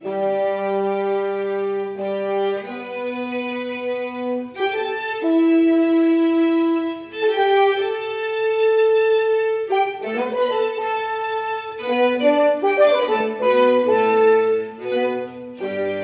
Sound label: Octet; Gathering Again